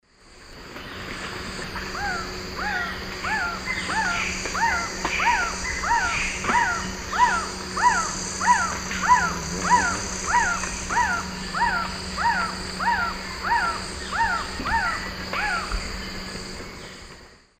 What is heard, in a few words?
Trogon melanurus, song; S. America; Eastern Lowlands